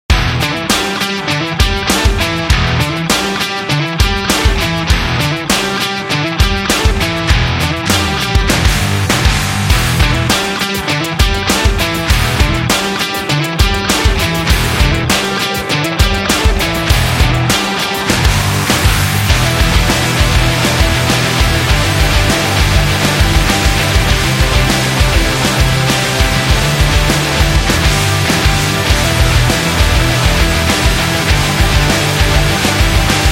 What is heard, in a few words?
Indie Rock Commercial